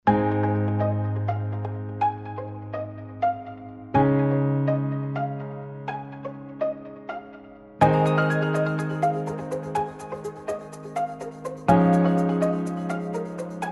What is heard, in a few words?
corporate